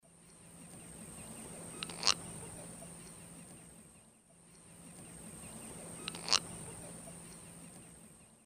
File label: Rostrhamus sociabilis, flight call; S. America; Eastern Lowlands